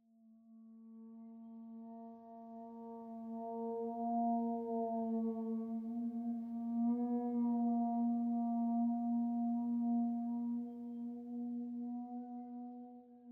Scary Scratchy

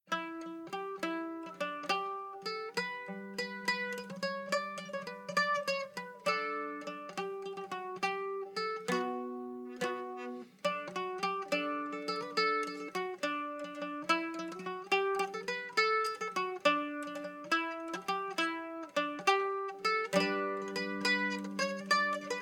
Tavern; Assassin's Creed Unity

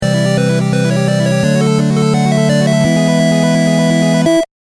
Key Item Get! (8-bit); Lenna's Inception

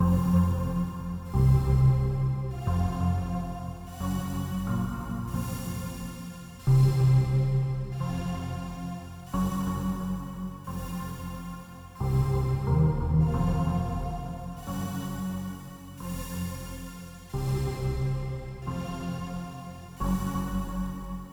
synthmyst; Toki Tori 2+; Toki Tori Collection

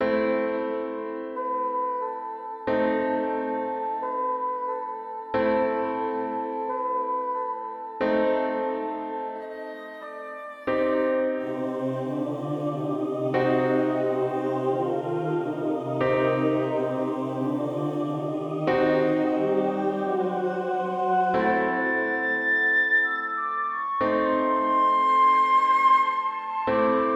Scene (Creepy); Insurgence Second Assault

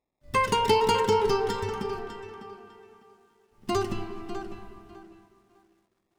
Oneshot Acoustic Guitar; zombie army 4 dead war ps4 stadia switch windows xbox one gamerip 2020

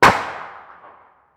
outdoor gunshot; a dump in the dark

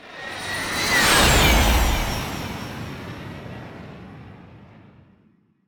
Jet; Yu-Gi-Oh! Master Duel

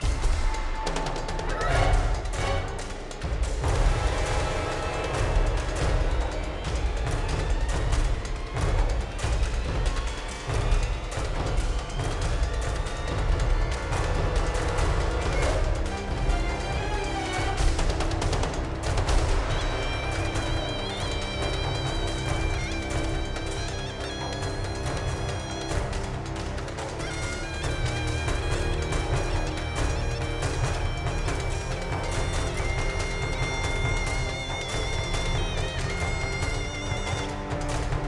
Intense Loop; modern combat sandstorm

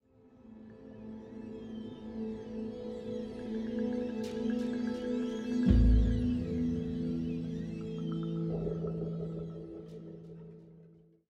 Military High 85bpm

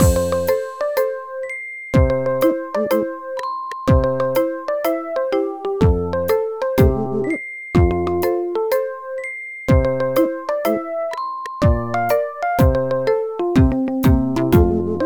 BGM Mini Game; Kirei Zukin Seikatsu 2; NDS

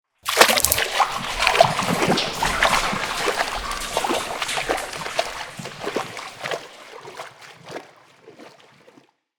pipegrill entrance

